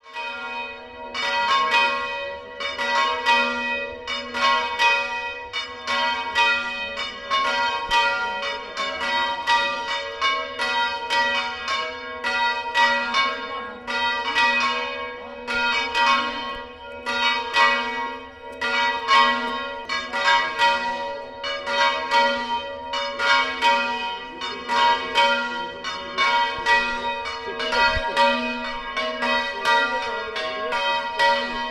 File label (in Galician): Bandeo Campanas